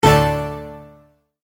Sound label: Multimedia Tone-Stop; Tone Sound Effects; Tones (Sound Effects:Sound Design); McKinney Sound